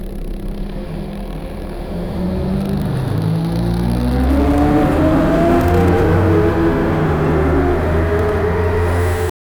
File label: flying car